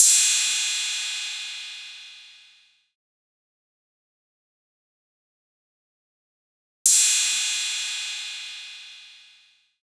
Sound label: Drum Loop - 140 BPM Cymbal; Footwork; Experimental Drum Loops; Cymatics; Mystery Pack Vol 4